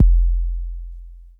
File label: Kicks, Elektron MachineDrum SPS1 MKII